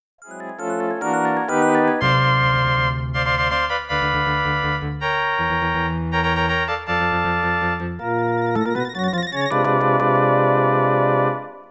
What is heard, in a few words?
Game Start; Daimakaimura; G.S.M. Capcom 1